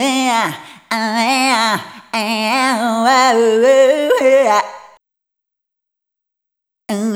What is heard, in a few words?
Rhythmic A